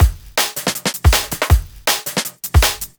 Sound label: 160, Drum Loops; M8; Electronisounds